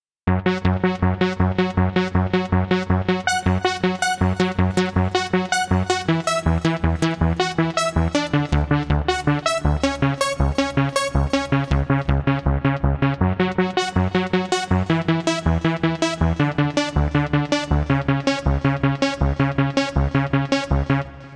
arp 2 octaves; the transmitter soundset

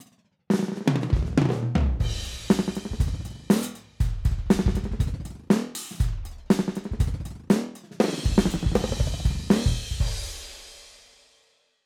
drums transformed; modular effect board; sonic instruments

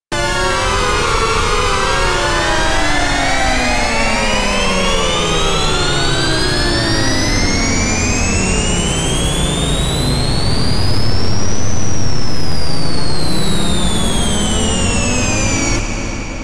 fx space seq; the transmitter soundset

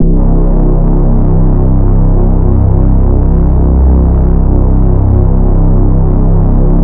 goat phonk reese bass; phonk toolkit; rocket powered sound

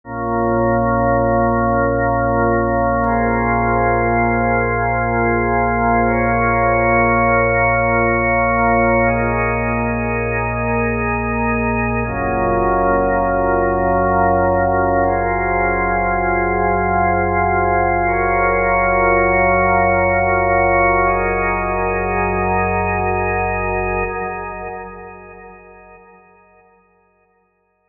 organ; fire by music sound software

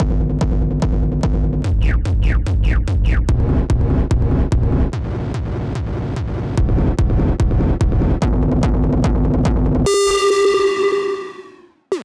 bombo; hyperfocus dsp

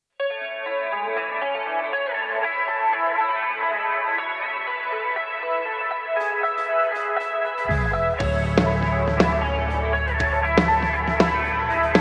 drum kit; uranium drum kit; polarity audio

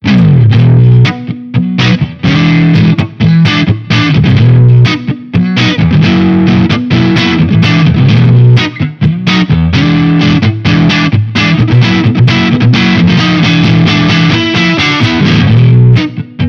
blues

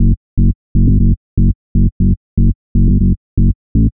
sub losing it; ultimate vital bundle; rocket powered sound

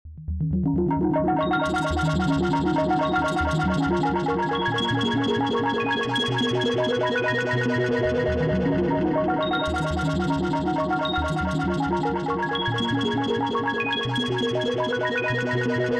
sine pluck wet; soliton; chiral audio

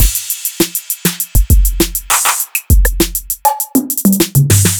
acoustic 808s kit; by uppercussion

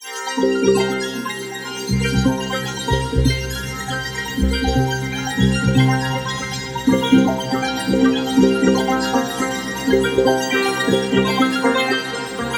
Cinematic Tension Builder; kaleidoscope

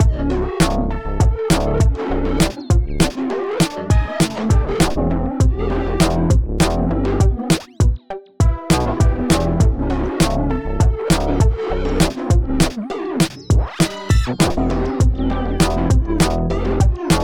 100bpm snare stomp; xen; florian mrugalla

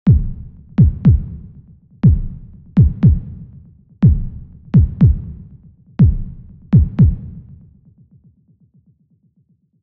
vital presets; chiqui audio; vital fx presets

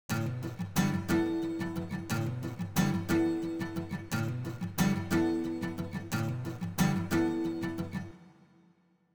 acoustic guitar ringing eko verb; hofa plugins

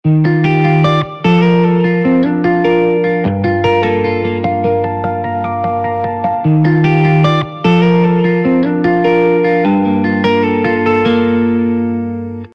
guitar; evergreen by warmth dev

